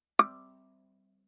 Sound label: Viola pizz ff sul D D6; MIS Pitches - 2014; Strings